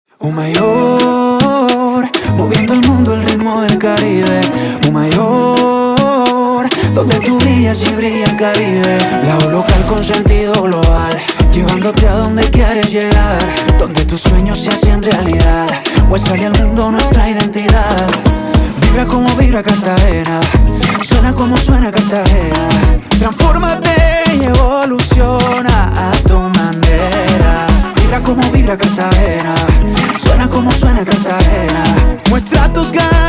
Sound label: Jingle; UMAYOR